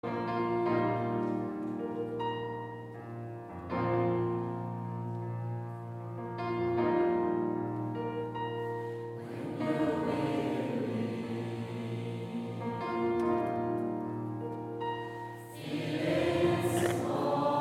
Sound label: gospelchor; Live-Mitschnitte aus Effata-Konzerten